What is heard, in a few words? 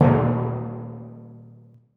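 timpani f left hand d#1, percussion; Sonatina Symphonic Orchestra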